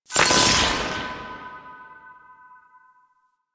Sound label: Protective Shell Break; Warframe; Citrine